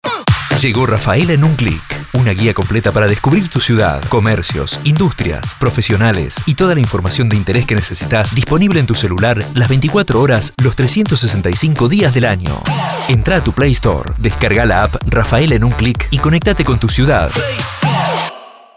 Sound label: pop